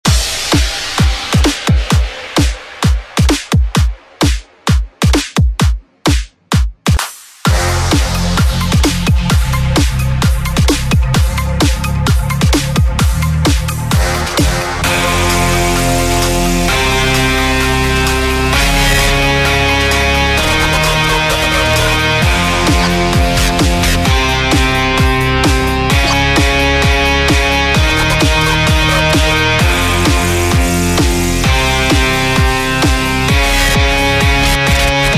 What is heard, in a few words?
Rock EDM